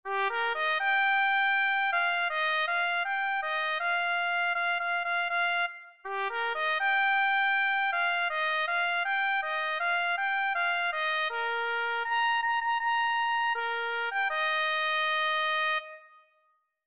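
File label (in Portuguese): COMANDANTE DO EXÉRCITO clarim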